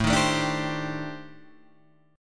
C Minor 6th Add 9th A

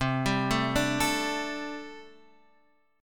C 6th Suspended 2nd C

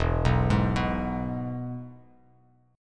E-Major E, Bass Standard; arpeggiated; JGuitar

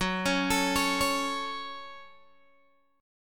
F sharp Minor, Guitar, Open G; chordshape; down

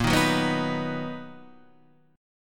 B Major A sharp; B/A#

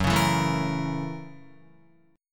Gb 7th Sharp 9th Gb Guitar; strummed; Gb7#9; JGuitar

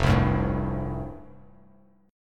F Major Add 9th, Bass Standard; Fadd9 strummed; JGuitar

